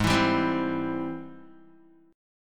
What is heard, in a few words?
Ab Augmented Major 7th Ab Guitar; strummed; 4,7,5,5,4,x; Ab+M7; JGuitar